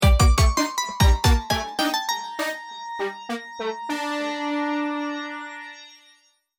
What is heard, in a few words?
gameOver; TRORB